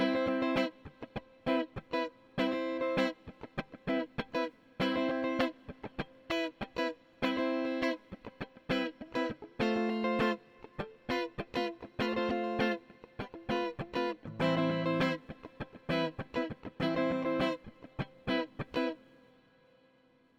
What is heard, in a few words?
Guitar Rythm Reverb